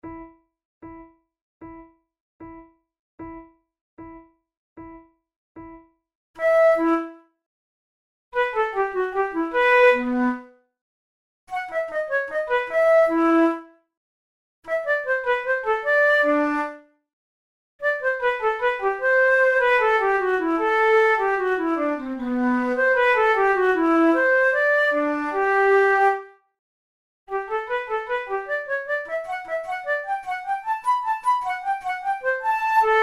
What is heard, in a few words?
in e minor, flute